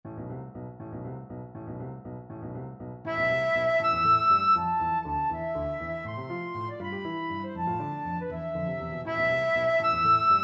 scherzo, flute

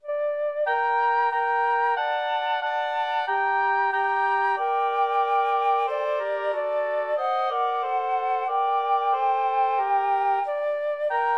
trio, flute oboe clarinet

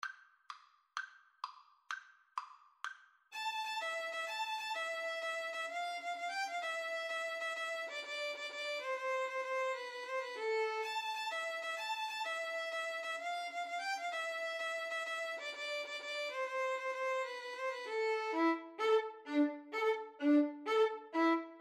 tarantella violin

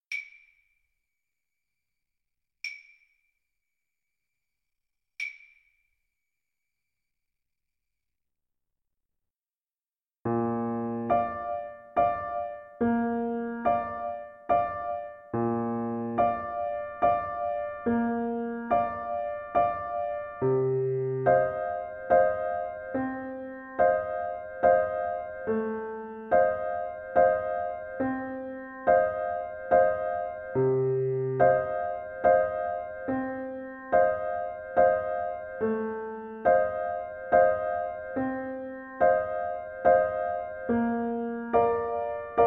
blue danube, clarinet

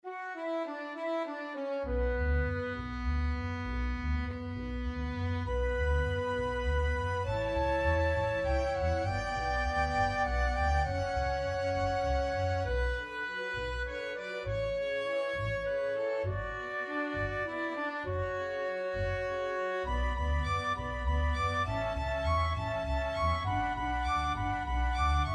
spanish violin, flexible mixed ensemble 5 players

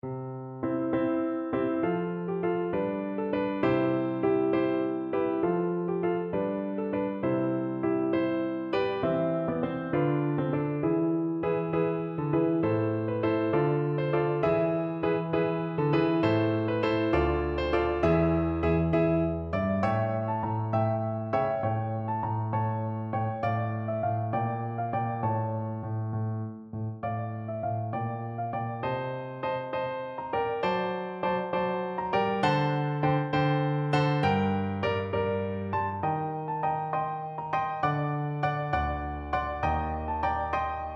siciliano, piano

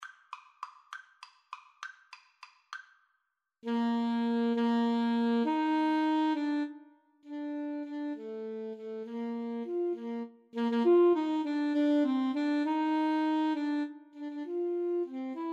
sullivan tit willow 2 A SAX

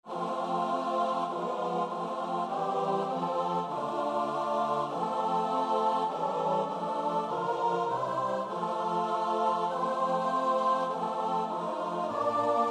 choir

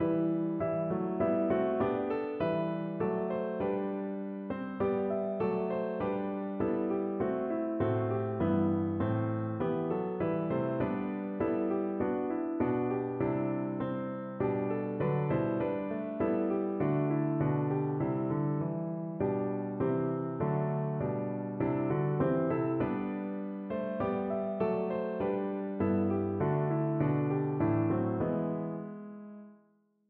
piano

